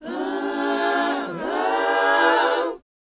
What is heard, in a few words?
BOYZ, Vocal, R&B Dance